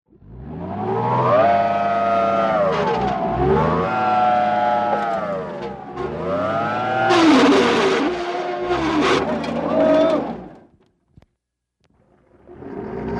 zvuki probuksovki

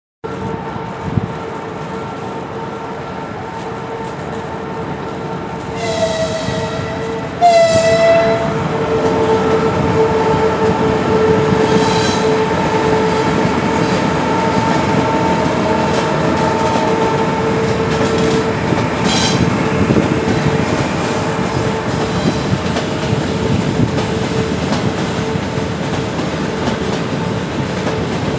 zvuk poezda

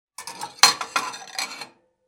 zvuk tarelok

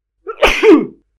zvuk chihanija

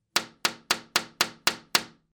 stuk v okno zvuk

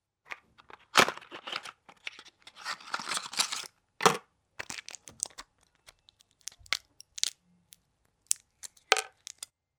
zvuk tabletok

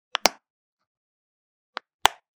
kryshka tjubika

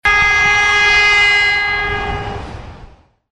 zvuk gudka poezda

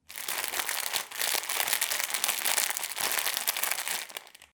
zvuk paketa

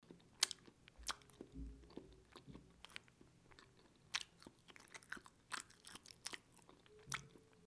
zvuk chavkanja

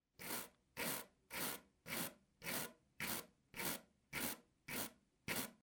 zvuk shhetki

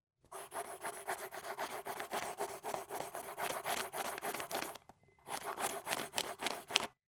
zvuk karandasha

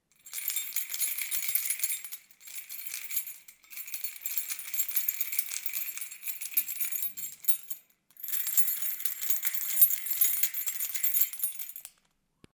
zvon kljuchej

